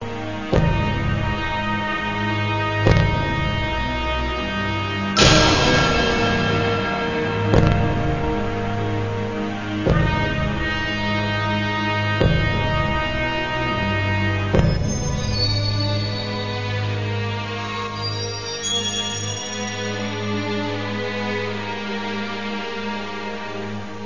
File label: Background